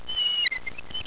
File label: squeak